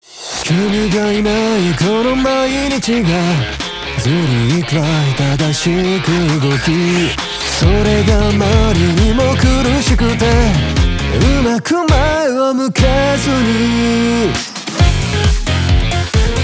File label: vocal